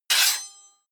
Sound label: hit metal sword small